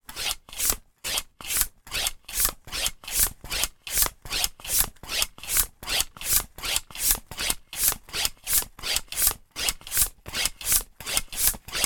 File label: transport bicycle hand pump operating slow speed handle piston end